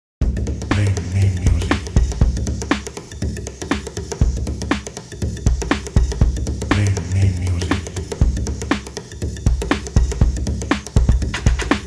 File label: DRUM; drum kit one